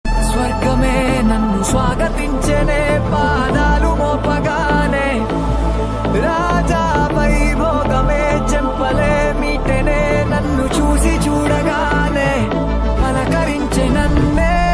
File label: song telugu; Kalki